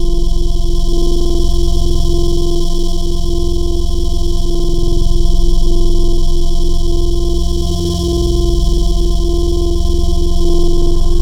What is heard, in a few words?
Organ Classic; Keys; Synthetic's Serum Collection; ESW X Keys